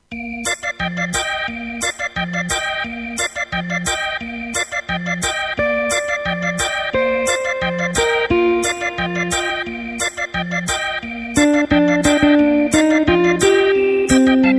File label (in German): Drehorgelmann